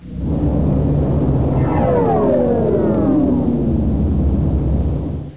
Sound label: SHUTDOWN